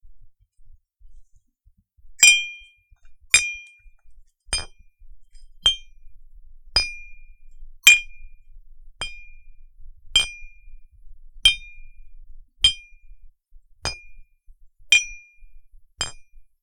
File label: Metal